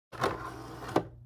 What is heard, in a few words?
dvd player sound effect